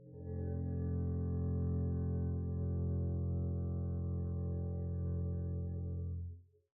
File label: arco f1 pp down, double bass; dsmolken